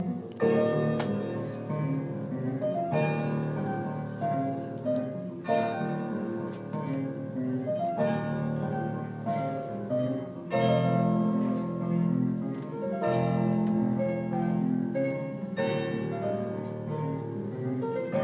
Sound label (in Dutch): slotstuk dom carillon